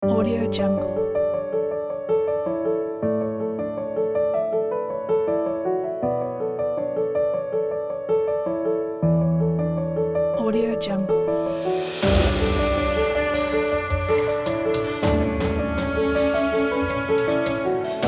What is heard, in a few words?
inspirational moment